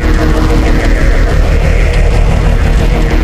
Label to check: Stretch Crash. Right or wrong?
Wrong